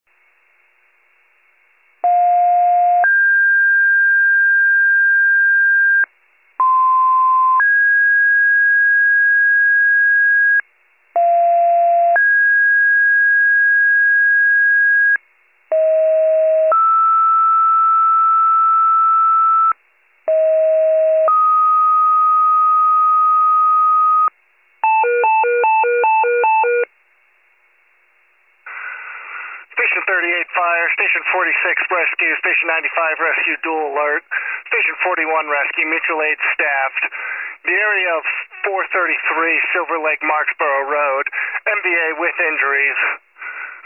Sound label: fire, pager alert